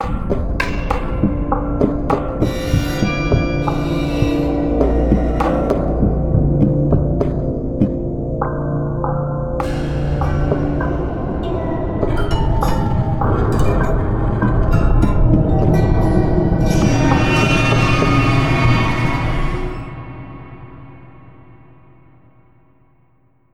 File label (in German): cinematisch; Klanghaus KH2